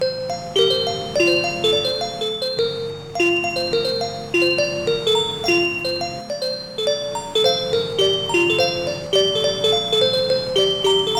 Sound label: metro Sequence